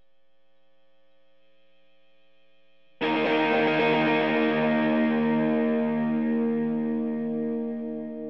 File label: GTR; Pro Tools